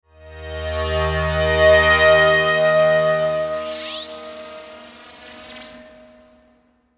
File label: Logo Slow Swell Ascend; Sonic Logo; Blastwave